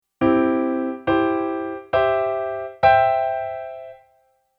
Inversions

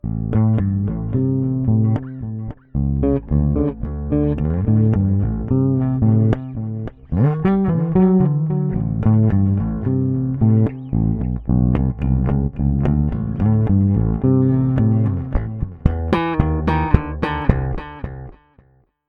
bass delay

